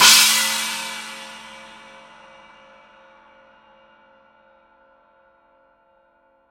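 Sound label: signature 18 heavy china edge, cymbales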